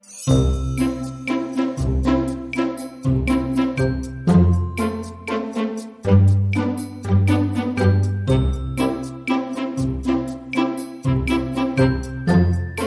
Traditional Percussion

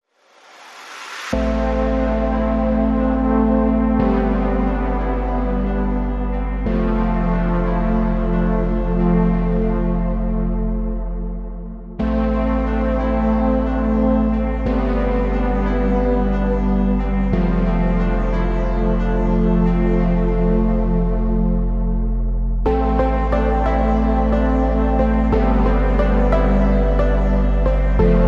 No Arpeggios

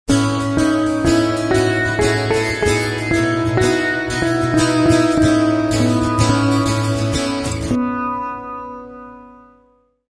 Acoustic Dream

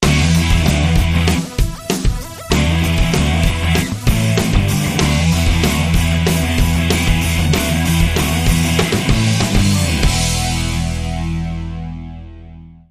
Rock

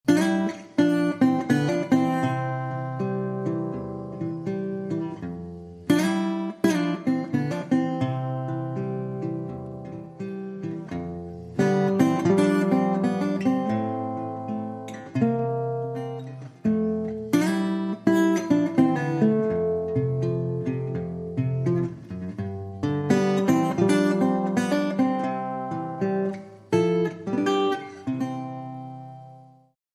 Steel String Ballad

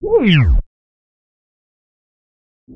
Round Modulated Transition

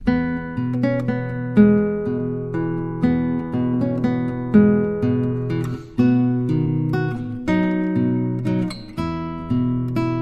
Guitar

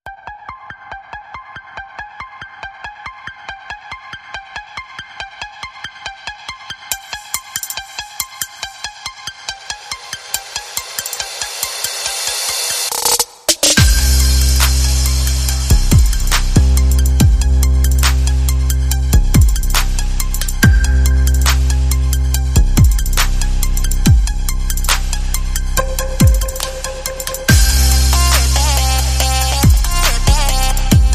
No Vocal

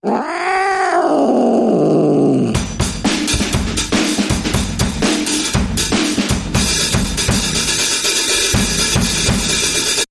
Cat Walk Dance Bumper

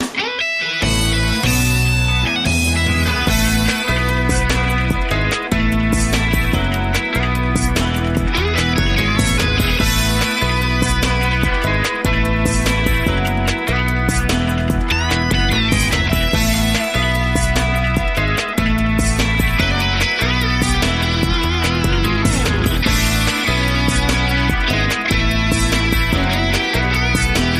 Breaking News Bumper

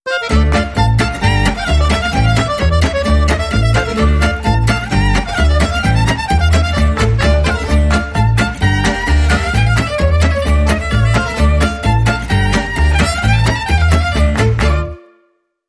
Romanian Caravan

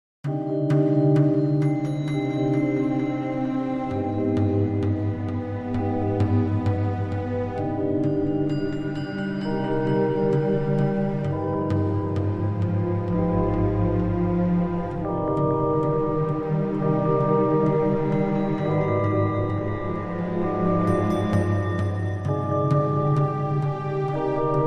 No Vocals

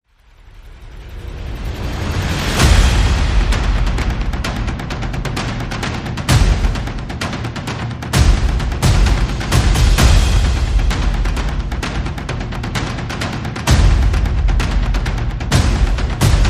Percussion and Effects

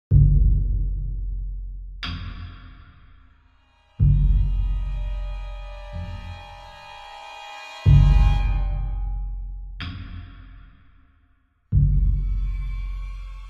with Drums

